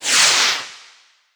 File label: Fireworks Fly; Angry Birds Rio